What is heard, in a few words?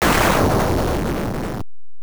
Thunder; Genesis